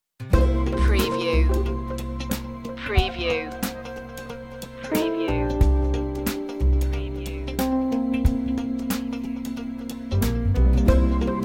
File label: life style tv light comedy